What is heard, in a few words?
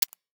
camera shutter; Windows; Screen Clipping